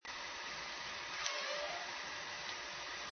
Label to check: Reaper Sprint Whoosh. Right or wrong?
Wrong